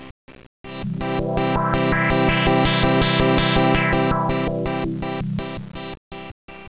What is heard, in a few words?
SWEEP, Siel DK-600